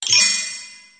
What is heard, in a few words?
bell, electronic instrument